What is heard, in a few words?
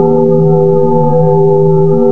GOTH LOOP; PC Gamer IT CD 35